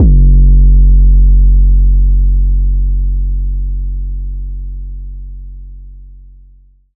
808 F#; Cymatics

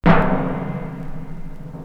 Orch Drum

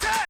Vox

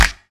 Snare

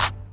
clap

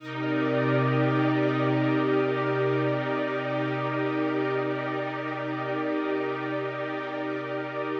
Strings

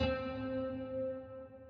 Wake One Shot

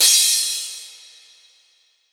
Crash

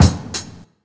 Kick

